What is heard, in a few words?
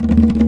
Arabic percussion; ETHNIC and WORLD PERCUSSION; Arabic Percussion Kit 3